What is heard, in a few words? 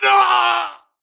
grunt death crush; Titanfall 2